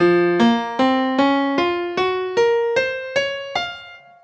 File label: hankdrum F akebono